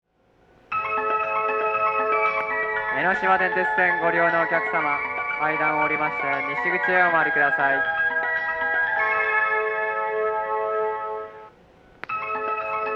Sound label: kamakura melody; jreast; yokosuka